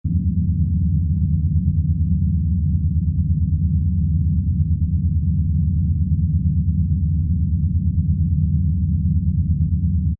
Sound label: Spaceship Rumble Loop, Spaceship ambience, deep space ambience or flying saucer interior; Seamless loop; Science Fiction Sound Effects; LynneMusic